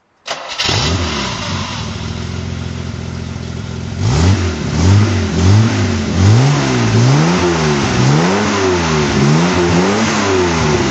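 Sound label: jaguar etype s2 throttle, engine sound; jag etype 42 ots s2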